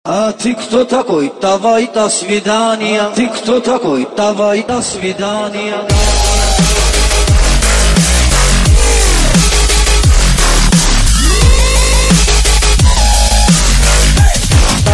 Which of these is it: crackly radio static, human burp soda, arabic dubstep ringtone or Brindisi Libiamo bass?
arabic dubstep ringtone